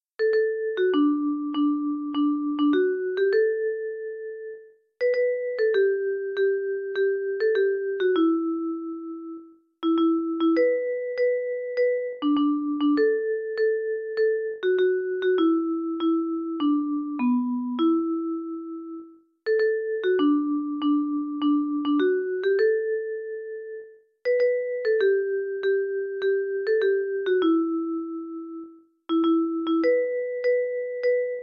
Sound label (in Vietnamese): nhac Hanh Khuc midi